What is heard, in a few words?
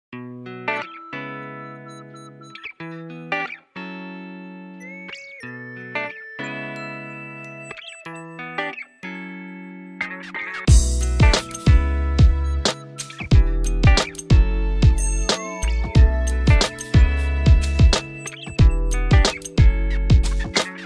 beat